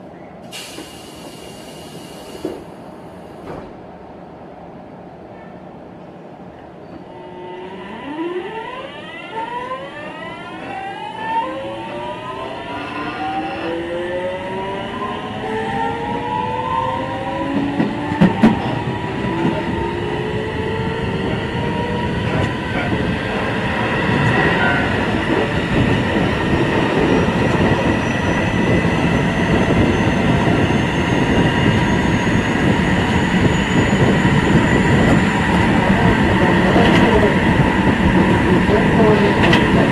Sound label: vvvf, mitsubishi; shinkeisei 8800